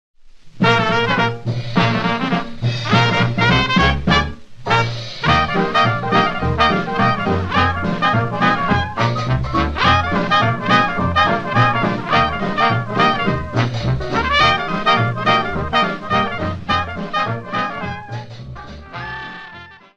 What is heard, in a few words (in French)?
Rag, Etats-Unis